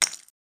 rock falling

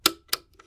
Model D - Switch; Keyboard Sounds

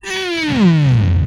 Death, Vocal; retro arcade

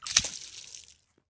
splash; water splash slime sfx

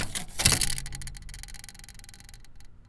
Boyioioing; BB Retail Therapy Sample Pack

